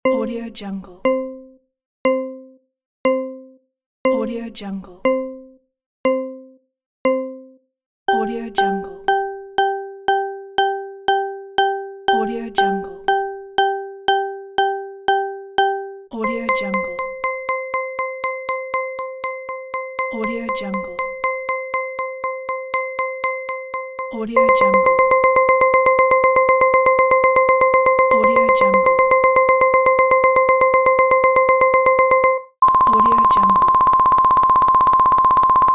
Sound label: timer